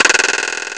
krrr; Amiga Soundtracker Sample Packs

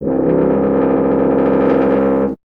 BRS TUBA, Solo Instruments

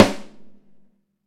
Ludwig Snare, EMU PX-7 Drum Machines

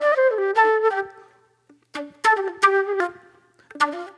Flute, Disco; Sonic Foundry (Sony Creative Software); Manhattan Flutes; Session 04 - Cruisin' Disco

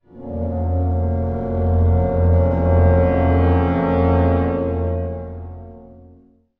brass accent; Orchestral Loops

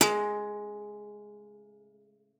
G#3, GUITAR; Xsample VOL-2

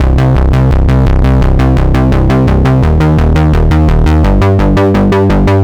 ARP Bass A, 85bpm, analogue circuit; musicradar

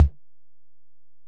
Tom, Alesis DM-PRO

